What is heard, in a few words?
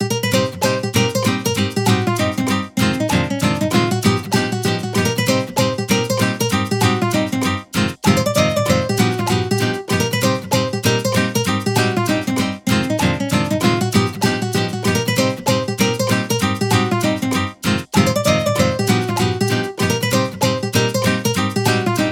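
BGM Toy Puzzle Result Lose; Clubhouse Games: 51 Worldwide Classics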